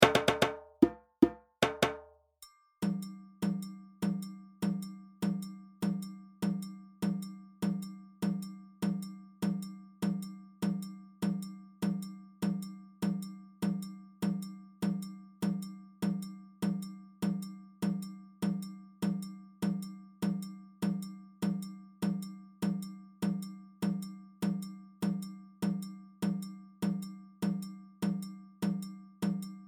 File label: Kenkeni; Sorsonet